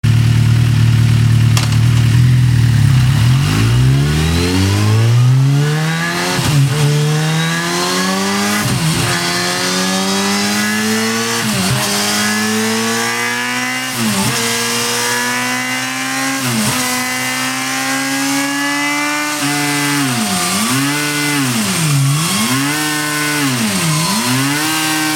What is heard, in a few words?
SUZUKI GSX R 1000; 2009